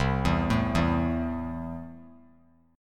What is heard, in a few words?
C 6th C down, chord; C6 arpeggiated